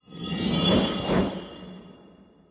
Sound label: magic path; wild bazaar